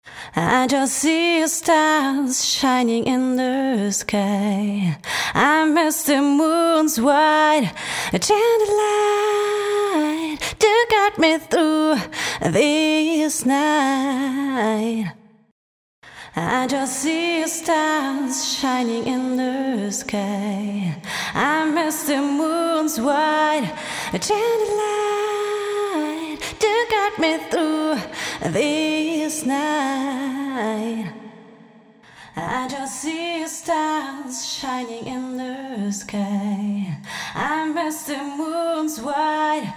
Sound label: Female Vocal, Reverb; AtomicReverb